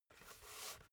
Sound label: basketball spin turn in hands short; sport freizeit